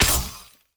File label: Enemy Attack Basic Hit; bloom minigame